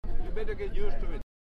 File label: loop